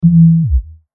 Laser Zap, Digital Science Fiction / Cartoon Laser; Lasers; Crafted Signals